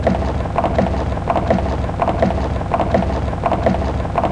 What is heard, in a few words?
search Sound; BIBLELND